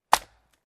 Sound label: Light Wood Chop